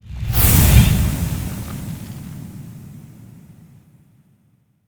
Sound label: sound Save Point Fire